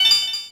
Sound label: Call Connect; Samsung SGH-ZV50; Clean